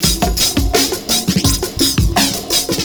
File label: PERCS, 70 s Breakbeats